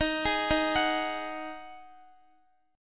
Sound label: D sharp Minor Double Flat 5th, Guitar; arpeggiated